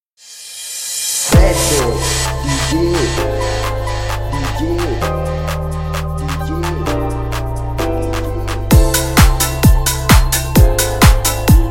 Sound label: House Mix